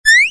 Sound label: Kitty happy Cat Sound; Robot Wants Puppy